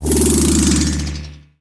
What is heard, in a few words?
magic